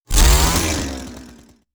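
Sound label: attack chainsaw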